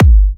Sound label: G, kicks; markus hakala kicks